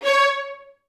VI C#4, Advanced Orchestra; Best Service

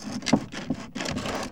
LITE BOX, Box Noises; E-MU Producer Series Vol. 3; Hollywood Sound Effects

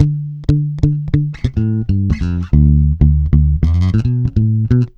FUNKY WEST D, Bass, 96 BPM; Ultra Freakin' Bass; Sampleheads

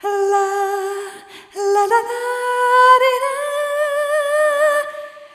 Arrhythmic G

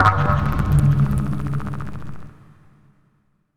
SPACE-FX

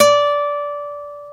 NYLON D 4B, GUITAR; AKAI S-Series CD-ROM Sound Library VOL-8

